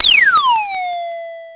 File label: Drum, Electro-Harmonix Spacedrum